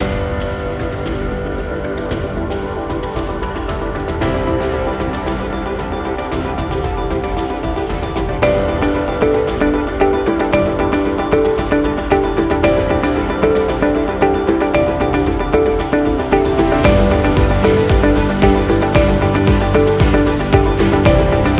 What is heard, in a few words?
Educational